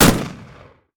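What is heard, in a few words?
marlin exterior shot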